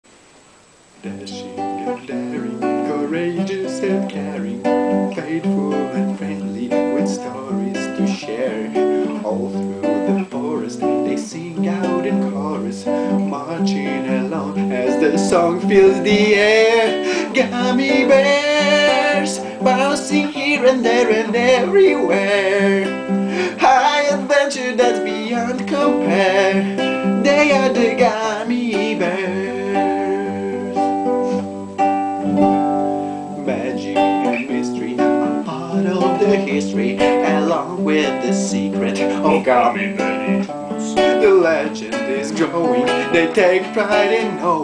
acoustic; Gummi bears

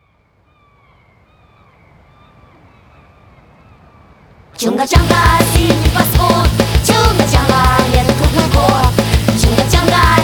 hardcore

